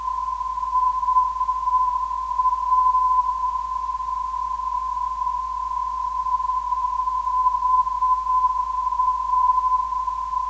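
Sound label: non-directional beacon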